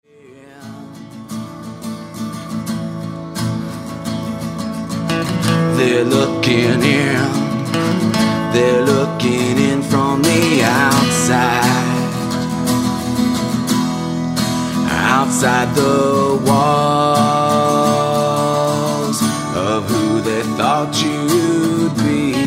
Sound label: acoustic